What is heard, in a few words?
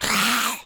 Zombie